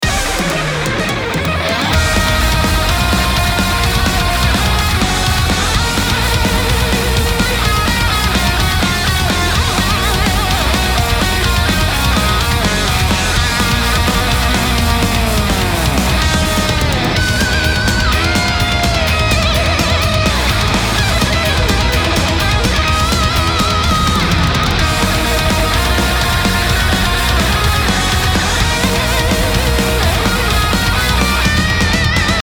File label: Heavy Metal Medley